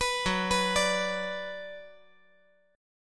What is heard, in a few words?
Gb Suspended 4th Sharp 5th, 5G,4C,5E,5A